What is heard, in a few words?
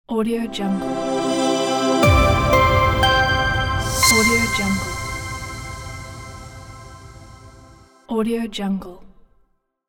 logo intro